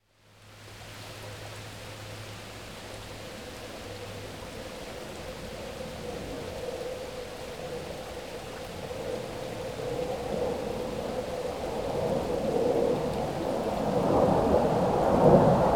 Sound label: Aircraft Flying Overhead; KMP Film Video Sound Effects